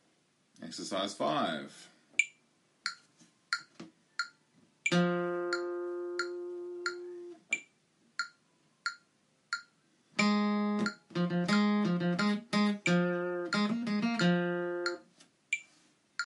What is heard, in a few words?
Melody